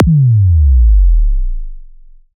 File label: Kick Drop, Sub Drops & Boom FXs